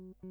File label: Gtr Line